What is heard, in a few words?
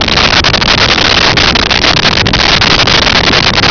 amb lava flow loop